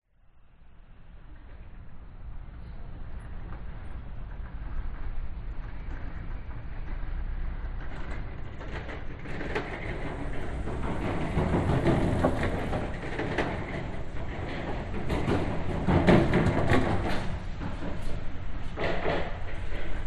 public places city boardwalk tunnel pedestrians cyclists runners pass; city reach